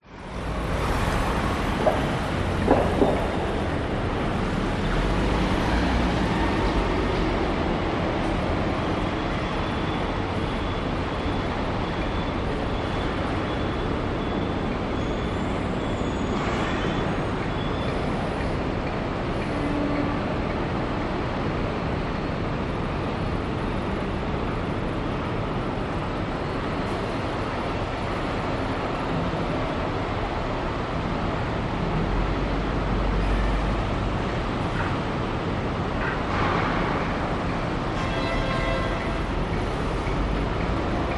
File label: public places city amb traffic dist construction under rail flyover few people; midday